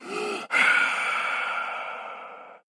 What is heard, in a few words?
ghosty sigh